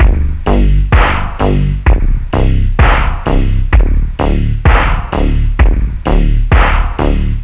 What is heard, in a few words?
POPBEAT; MUSICAL